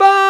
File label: VOX BAH G5, Scats Choir; 90s Sample CDs; Club-50 - Foundations Roland; Short Scats